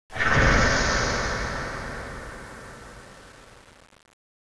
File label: strange